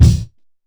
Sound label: subtle reverb bass drum D# key; Duration 0:01; Samplerate 44100 Hz; Bitdepth 24; Mono; kick drum